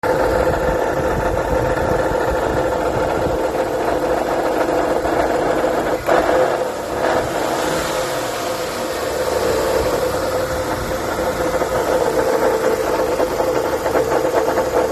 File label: rumbling sound stratus engine; chrysler 200 sebring